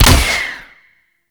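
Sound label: Fire, Hunting Bow; Paris Redux; Weapons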